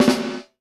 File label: FLAM VERB, PROC SNRS, Drumworks